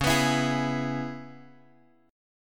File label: Db Minor 7th Db down Guitar Drop D